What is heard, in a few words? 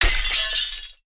glass break; teardown ps5 windows xbox series xs gamerip 2022